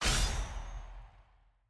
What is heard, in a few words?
ui client click